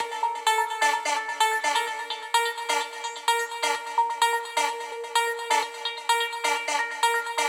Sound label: 128BPM Melody; Vengeance Samples; Unimatrix Kit